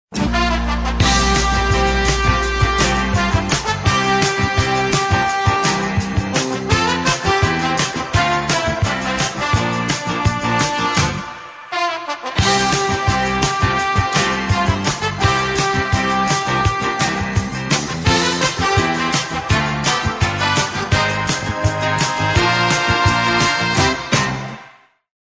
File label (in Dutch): intro muziekje